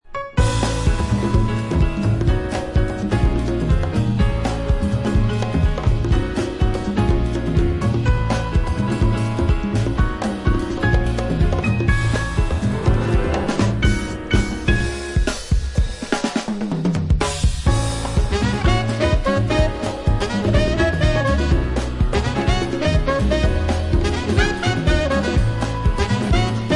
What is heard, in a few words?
hi-tech jazz